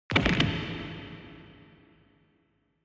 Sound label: Fracture Reverb; 骨折02-3(リバーブ)